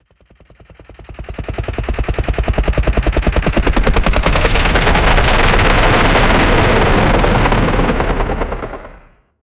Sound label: cinematic sound helicopter; 5 sec